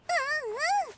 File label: HONK; WAIFU